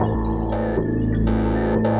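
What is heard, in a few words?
Spooky Sequence Bass, Keyboards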